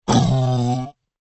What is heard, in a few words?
dinosaur low growl; SoundsCrate